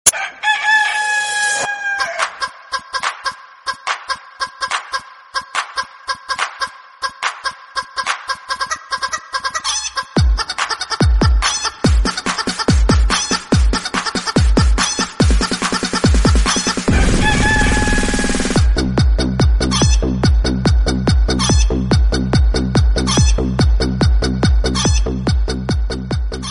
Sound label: funny rooster background music tone; funny ringtones